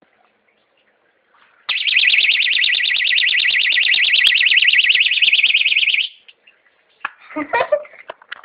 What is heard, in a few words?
my mums bag alarm thing going off